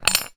household tweezers down wood close up

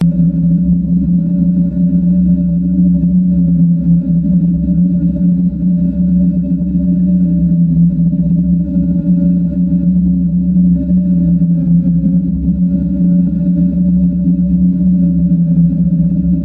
space drone; Audio Mangler

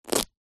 clothes rip cotton short; zapsplat; foley

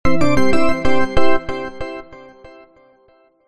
multimedia alert synth bright end complete; zapsplat

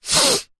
human sniff hard blocked nose; zapsplat

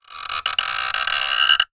wood pole turn squeak; foley; zapsplat

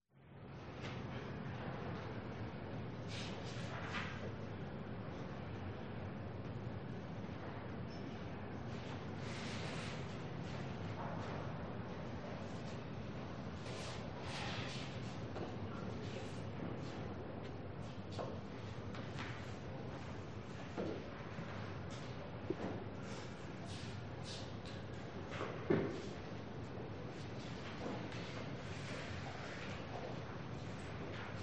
Library; audio hero

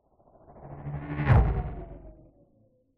science fiction hovering vehicle pass by fast

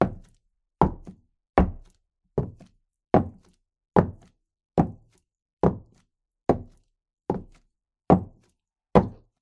Footsteps Female - Thick Heels Wood Slow

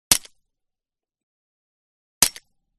GUN Shot Silencer Attached; WEAPONS

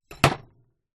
industrial metal wrench set down on surface; zapsplat

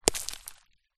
impact rock small hit solid ground

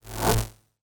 Whoosh Electric; little robot sound factory

